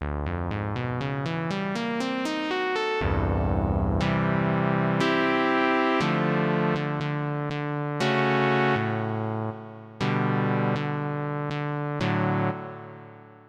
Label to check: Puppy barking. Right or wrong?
Wrong